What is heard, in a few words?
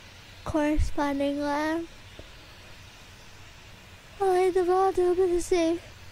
Yawn